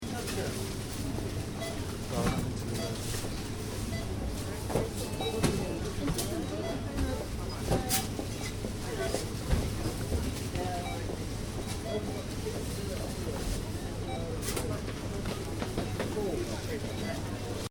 Interior supermarket, Supermarket, Interior: Ambience, Tills Beeping In Background; Stores and Malls; Backgrounds; Stores And Mall Sound Effects; Stuart Duffield